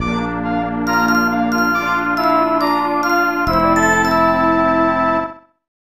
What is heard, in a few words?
Unused Fanfare; Gokinjo Bouken Tai; snes